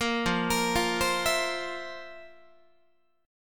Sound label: F Major 7th Suspended 4th F, Guitar; arpeggiated